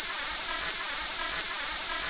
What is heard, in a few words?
burning dinamite; ProOne: Netcracker Netscape Navigator